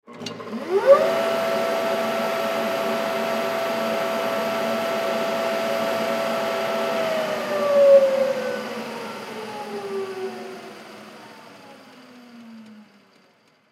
Floor Sander, Floor Sander Turn On Run Turn Off Short; Sanders; Industry